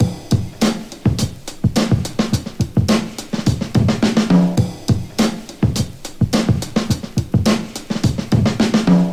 105 bpm drum loop sample a sharp key, kick tuned to the A# note